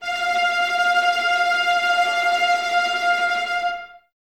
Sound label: VLS F Tremolo, Violas, String Ensembles; Miroslav Vitous